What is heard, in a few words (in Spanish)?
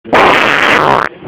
Pedo rajon